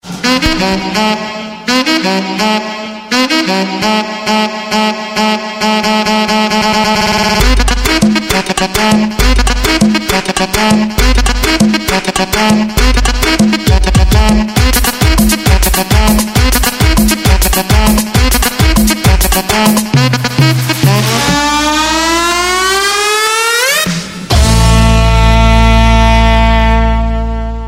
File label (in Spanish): cumbia, ringtones latino